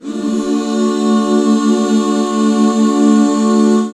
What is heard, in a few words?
HAL D, BACK CHORDS, Roland; Sound & Vision - Gigapack II CD 1